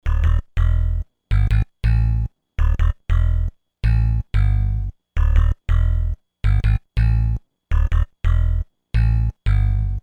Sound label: Bass Riff